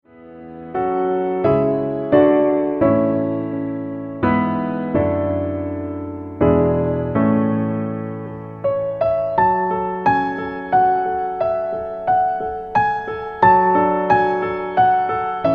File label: The First Noel (Pno Low); Christmas